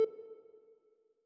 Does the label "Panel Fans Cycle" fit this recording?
Yes